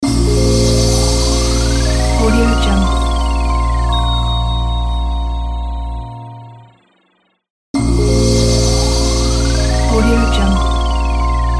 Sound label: elegant rb logo